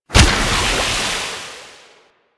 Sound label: Undertow Corrosive; Warframe; Warframes; Pirate